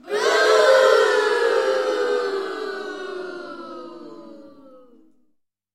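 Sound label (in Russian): deti nedovolny fuu, displeased children "fuu"; Негодование сборища людей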